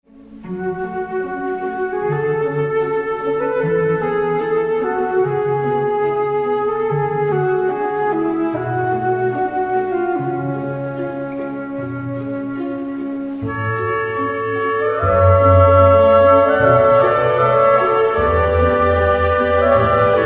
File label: faure pavane opening slow